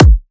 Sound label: Kick, Drums